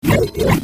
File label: teleport